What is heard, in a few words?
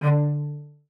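CELLO spiccato D3; Xsample VOL-5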